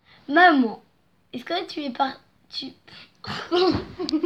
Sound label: Vocal